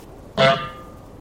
honk; goose

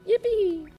yippee

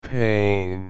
painnnn

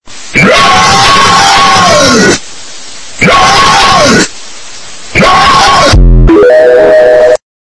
loud rah

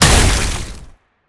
attack blunt

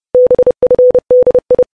robot first beeps; bfdi roboty

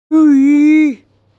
siuu deep

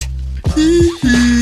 hee hee

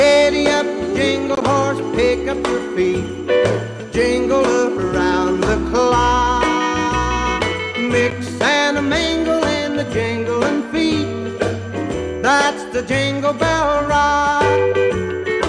cd jingle

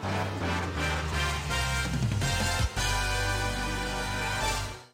level up

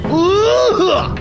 awhoohuh

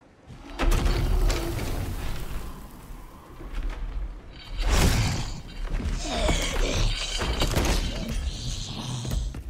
crawling from ceiling

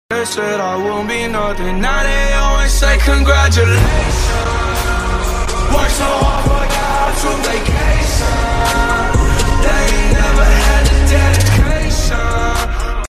celebrate

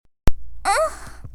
moan

